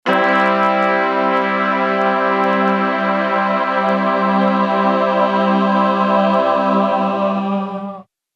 Mellotron M4000D M400 Blende Brass auf Mixed Choir

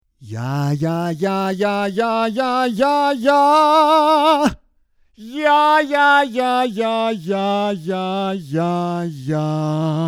600 Impedanz High Plate

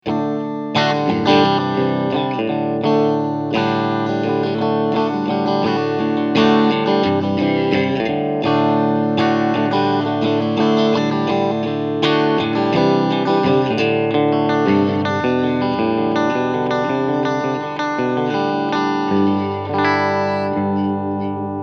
Steg Mitte Clean

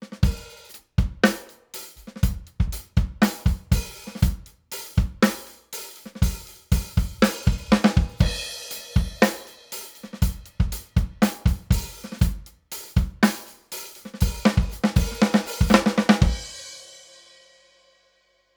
Drums ohne 5254; Rupert Neve Designs

